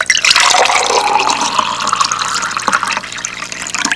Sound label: WATER GL, special effects